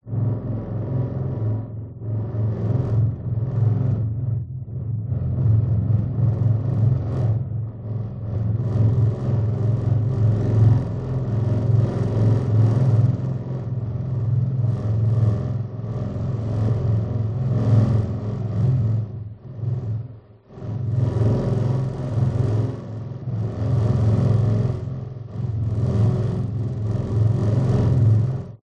science fiction spacecraft or other vehicle internal walls vibrate metal pulsatin; pulsating